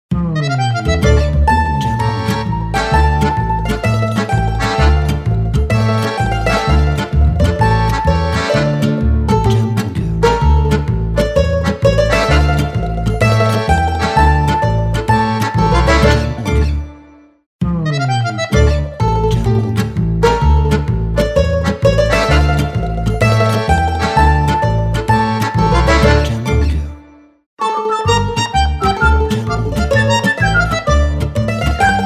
Italian Intro Logo; Italian Intro Logo Pack

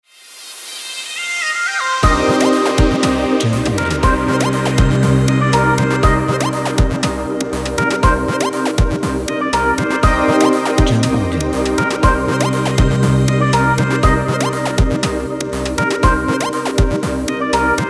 Urban Vlog Phonk Intro

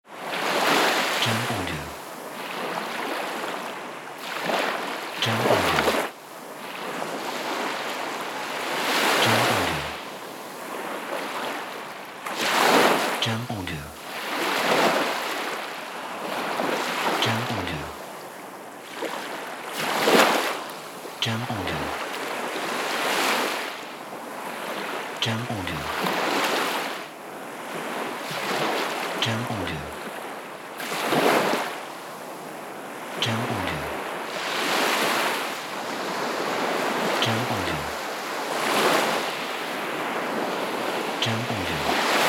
Sea Waves

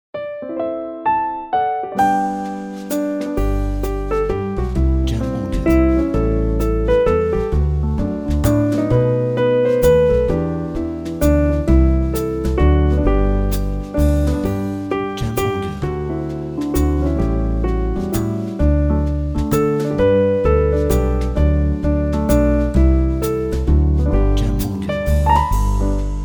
Merry Christmas Jazz

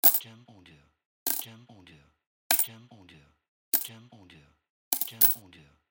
Single Coin Drop

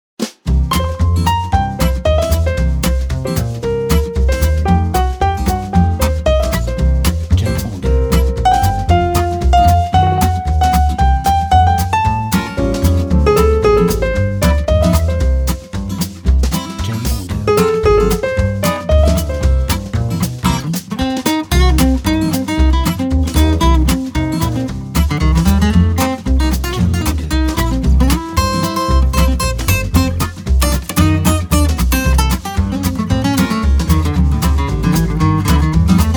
Happy Jazz

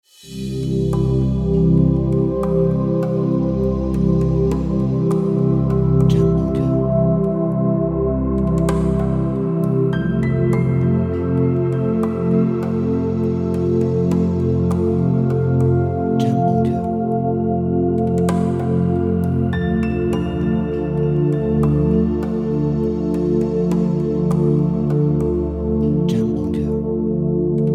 Relaxation Ambient

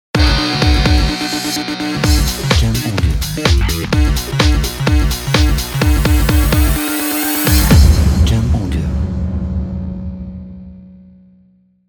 Powerful Rock Logo

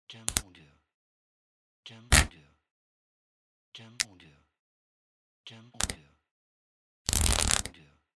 Game Scratch Sounds

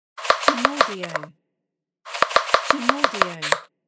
Fast Vegetable Cutting

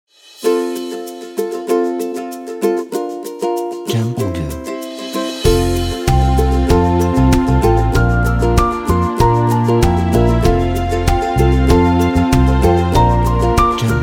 Happy Acoustic Intro

